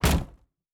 Shield Wood

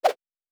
Whoosh; Fantasy Sounds Bundle

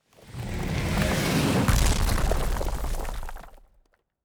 Nature Spell, Magic Sounds; Fantasy Sounds Bundle

